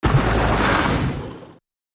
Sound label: boat drop